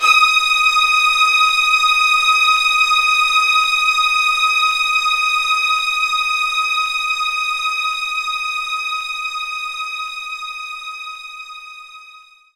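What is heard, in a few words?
String Loop D sharp 5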